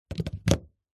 household electrical plug phone charger plug in wall socket